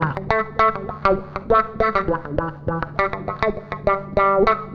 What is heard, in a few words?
WAH, wah wah gtr